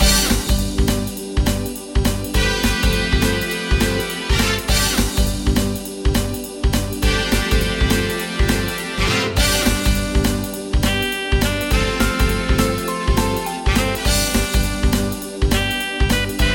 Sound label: Jazz Game Theme Loop